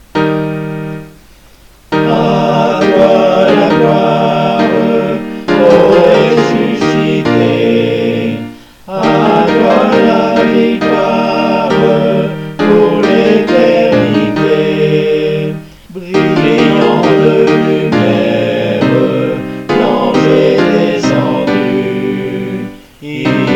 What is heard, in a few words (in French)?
A toi la gloire piano et 4 voix; Soprane; Alti; Ténor; Basse